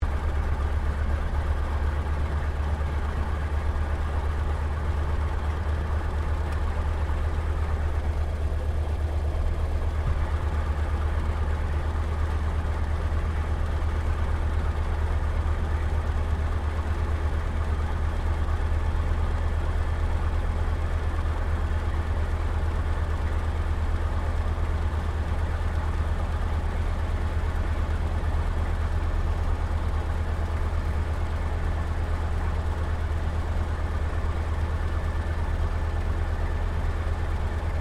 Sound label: AIRCRAFT T-28D TROJAN IDLE INTERIOR